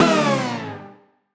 Poly Chord Hits, Ramp Down, G; future rave

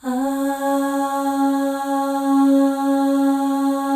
AHS, Symphony of Voices, FEM; Spectrasonics; FEM.2 AHS

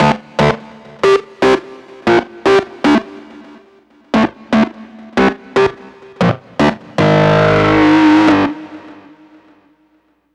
Grunge Lead; Riffs & Solos; Dr. Fink's Funk Factory

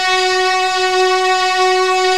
HIGH STRINGS

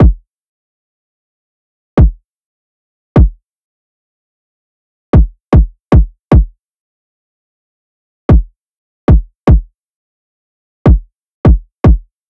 loop kick 152; Seismic Loops

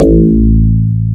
DX BASS; E-MU Formula 4000 Series Vol. 2

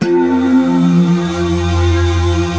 BELLWVE C2; Northstar - New Gold VOL-1

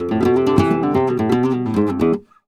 Flamenco Guitar Remates De Pulgar, e

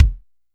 BD, SR16 drums; 90 s Sample CDs; Best Service

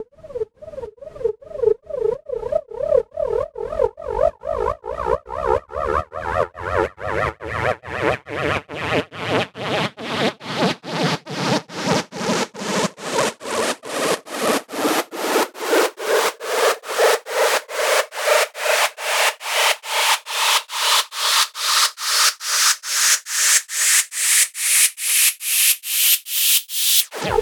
Sweeps Up, Effects

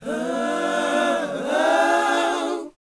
BOYZ, Vocal, R&B Dance

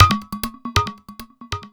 percussion loops, 138, Techno

